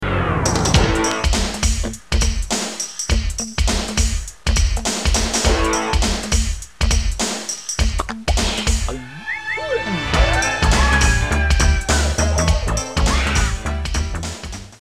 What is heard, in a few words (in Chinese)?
片头音乐